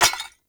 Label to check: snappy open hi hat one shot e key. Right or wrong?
Wrong